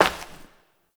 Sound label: Step Glass; Warframe; Koumei